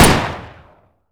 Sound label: glock fire to empty; zps; Weapons; Firearms